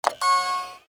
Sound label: beep